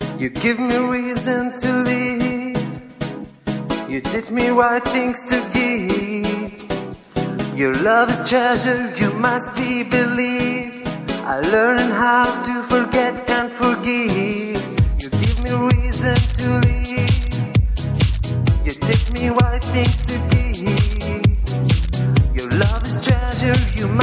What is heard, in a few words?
Electric Vibes